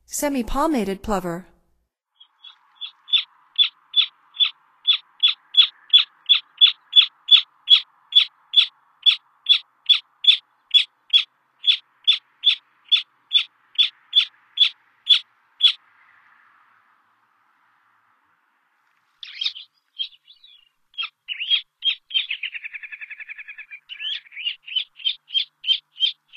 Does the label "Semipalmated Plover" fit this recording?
Yes